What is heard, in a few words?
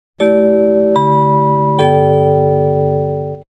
Chimes; NBC